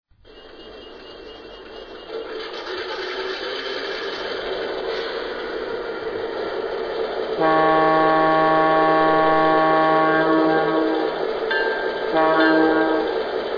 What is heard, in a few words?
EMD NW2